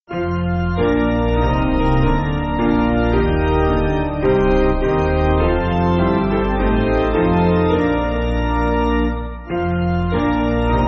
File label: Piano